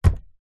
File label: footstep barefoot single wooden step